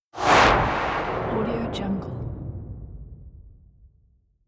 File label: horror transition